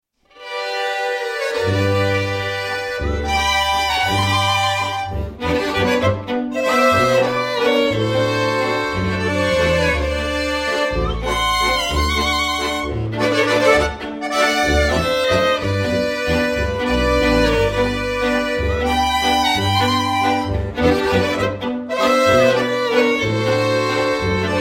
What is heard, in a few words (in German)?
Walzer